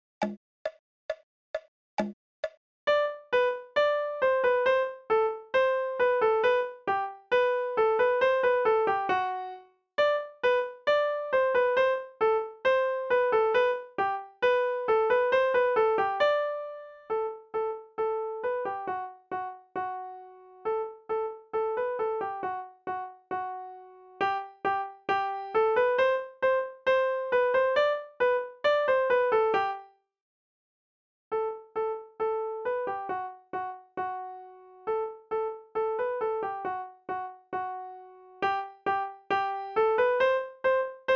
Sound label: Deben Gavotte right